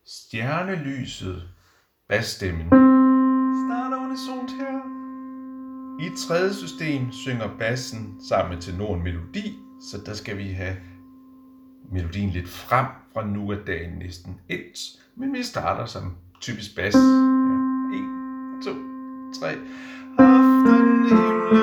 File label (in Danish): Stjernelyset bas